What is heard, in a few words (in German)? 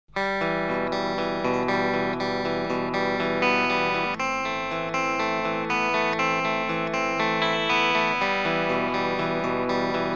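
M1000 Akkorde gezupft, LTD M1000, Clean Akkorde gezupft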